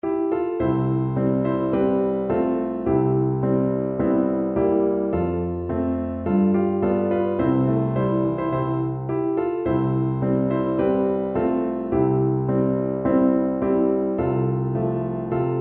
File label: Karaoke Piano; 津平小学校校歌